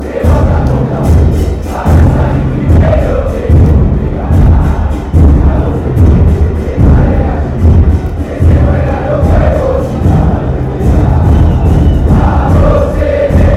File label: this is the gang of the bosteros, chants, argentina